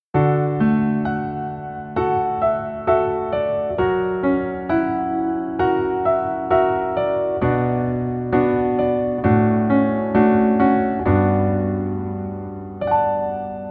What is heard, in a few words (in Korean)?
Piano ver, 멜로디MR